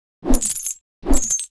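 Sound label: Mob attack; Bossmob; 금전방; 소안금낭귀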